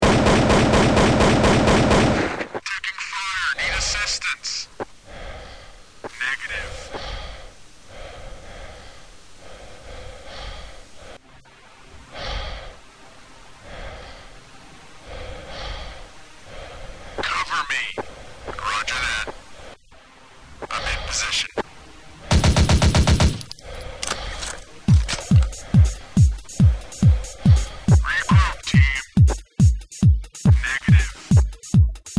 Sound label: counter strike techno